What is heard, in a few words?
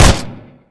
pulsemg fire, weapons